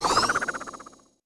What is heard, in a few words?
Super High Jump; Samus; Super Smash Bros